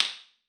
jazz chorus room; IRs

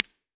AC30 brilliant+bass AT4033a neve, IRs

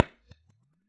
Science 2x15 3015 SM57 Port, Bass Cab IRs

